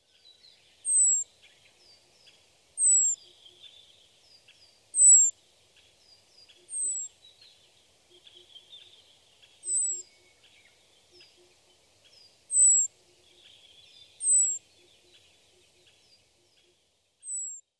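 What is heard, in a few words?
Dark-sided Flycatcher; doto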